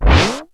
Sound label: D70 DRUMS; Rubber Chicken Software Sample Bank